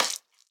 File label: Splat Squish